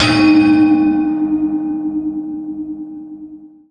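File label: percussion D, BELL+FLUTE; Best Service ProSamples vol.55 - Retro Sampler; AKAI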